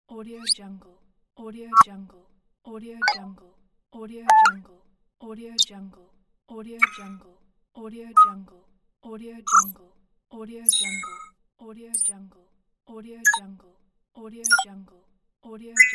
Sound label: game ui sounds